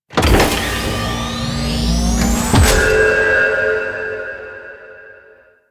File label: Datamass Available; Missions; Venus; Orb Heist; Bounty Two